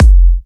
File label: F, kicks; markus hakala kicks